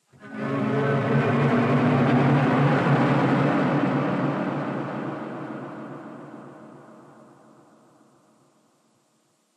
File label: Combat String Start